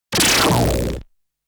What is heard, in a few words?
shooting sound